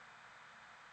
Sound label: lower loop breath